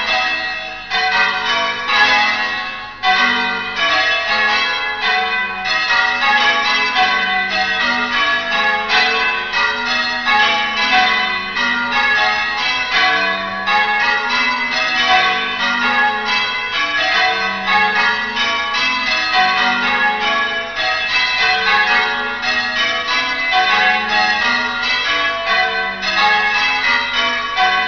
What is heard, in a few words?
bells